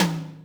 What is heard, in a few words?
Yamaha RM 50 Toms